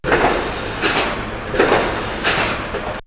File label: Punching Da Holes; X-Static Goldmine